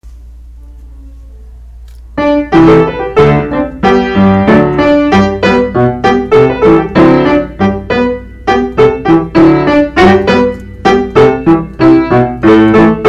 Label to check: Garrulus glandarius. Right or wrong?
Wrong